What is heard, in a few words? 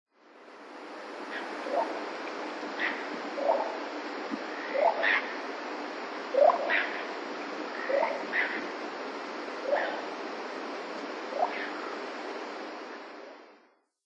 yotaka, nikko